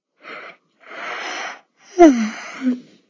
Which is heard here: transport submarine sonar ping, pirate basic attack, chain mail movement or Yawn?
Yawn